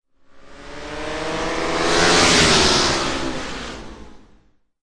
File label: tron cycles, Electro and Synthetic